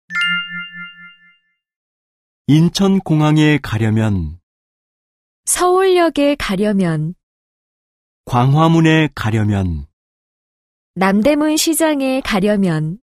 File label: K-POP